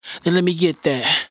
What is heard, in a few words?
Dry Vocals hook Lemme Get That; Raw Vocals; Slate Digital Omnivox Vocal Sample Pack